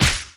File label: Option Attack Hit